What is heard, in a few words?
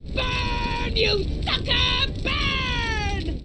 burn